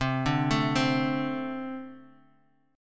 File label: A Minor Double Flat 5th